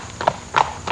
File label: Horse trot short